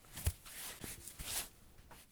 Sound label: item medkit use; salewa; Escape from Tarkov